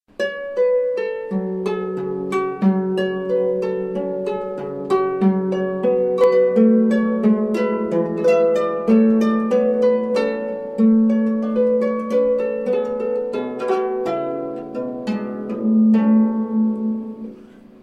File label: Instrument